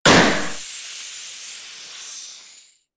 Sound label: Explosion; Sound Effect